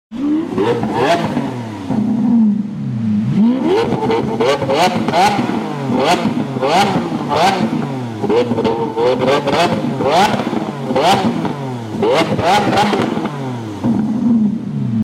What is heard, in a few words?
lamborghini sound effect